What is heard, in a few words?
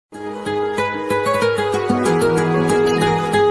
simple ringtone; english ringtones